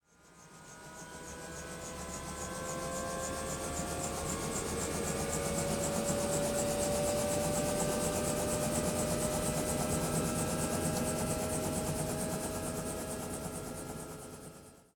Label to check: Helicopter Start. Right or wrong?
Right